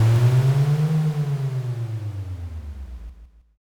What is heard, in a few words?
dragon